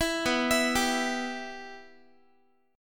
B Suspended 4th Sharp 5th B Guitar; Bsus4#5; arpeggiated; 19,19,19,17,x,x

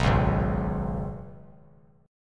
Ab Minor Double Flat 5th down; Abmbb5; strummed

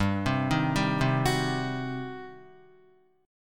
G Major 7th Suspended 4th Sharp 5th, Guitar; GM7sus4#5 arpeggiated; 2,3,3,0,0,2

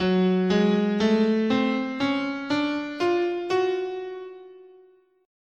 F sharp Hungarian Gypsy, scale

